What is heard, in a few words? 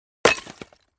Clay Chimney Wall Hit, concrete & brick